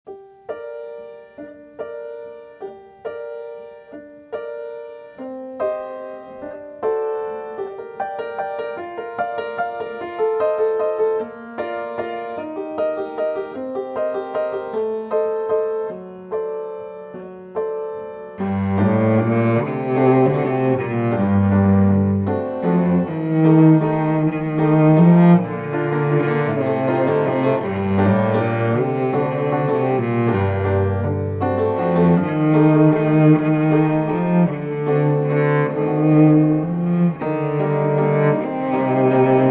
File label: Sad Circus